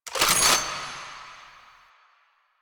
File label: Rechamber C; Quincy Assist; Warframe; Nineteen Ninety Nine; Quests